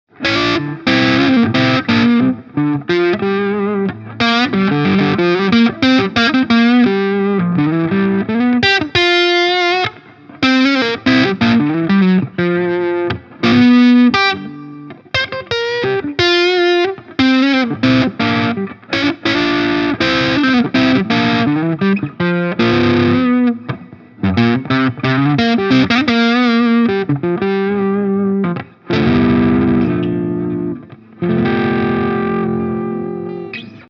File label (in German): Verzerrt Bluesy Halspickup; Harley Benton DC-580